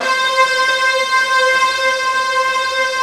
big disto; East West - Global Sounds

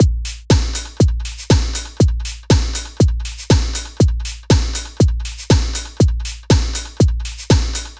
120 trance beat, Drum & Perc Loops

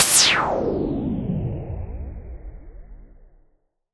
D&B Drop FX, Drum & Bass Kit; essential drumkit

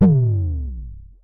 Synare Filter; Star Instruments Synare 3

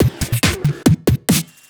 Fills, Loops 140 BPM

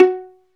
STR VL PIZ, Chamber Pizz; Roland L-CD702

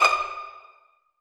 STR PIZZ A4 MF; Sound On Sound - Peter Siedleczek's Orchestra

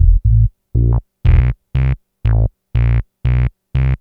Synth Bass

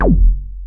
OB D#2, Rare Synthesizer; Hollywood Edge - Giorgio Moroder Rare Synthesizer Collection; OB 4 VOX.

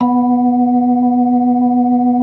B3 B 4; Best Service; Advanced Media Trax Modern Composer; B3 LES 1

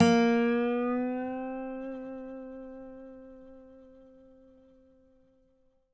DOBRO UP

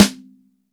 MIX SN, DRY STANDARD SNARE

